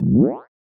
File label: message send; App; 3maze; App FX